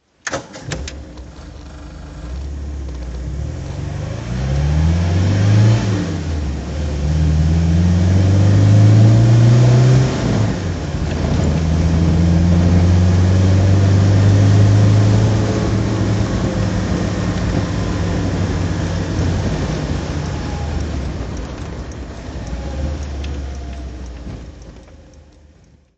mgb road drive

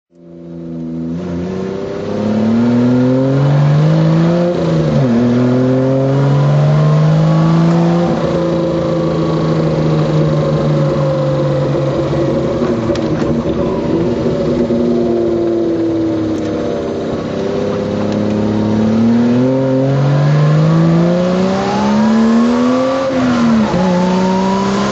jaguar supersport drive

